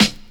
short snare drum sound G sharp key, snare drum tuned to the G# note; snare drum samples